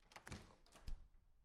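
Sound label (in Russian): дверь открывается или закрывается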